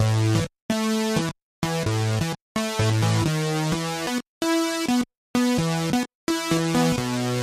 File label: funky house synth loop, 129 bpm